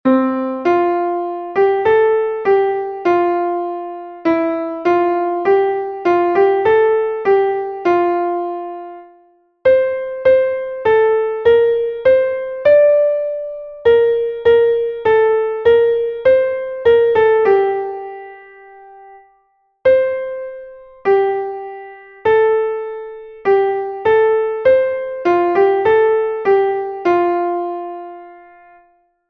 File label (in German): klavier; MelodieMeister